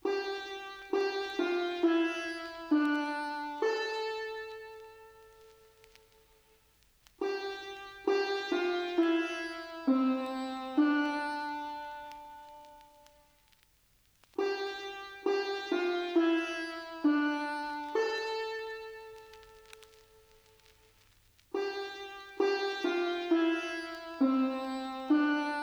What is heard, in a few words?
Sitar A 134bpm Vintage Vinyl